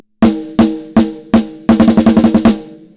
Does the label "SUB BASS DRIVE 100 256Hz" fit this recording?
Yes